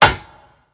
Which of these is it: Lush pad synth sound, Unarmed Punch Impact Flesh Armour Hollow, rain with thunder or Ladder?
Ladder